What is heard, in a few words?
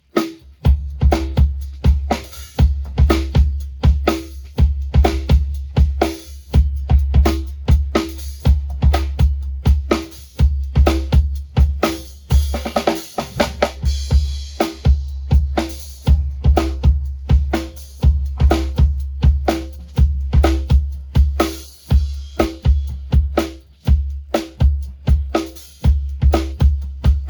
drums